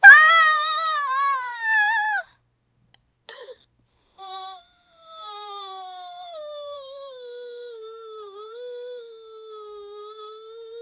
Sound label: a dying young woman, breath